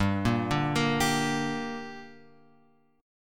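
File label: G Minor G, Gm Chord strum; Gm Chord {3 1 0 3 X 3} arpeggiated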